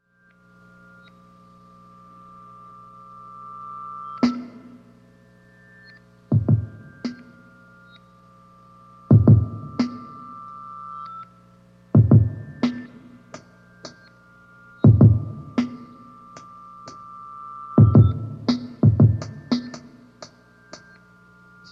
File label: glock loops